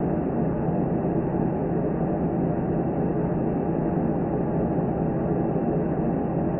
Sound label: alien energy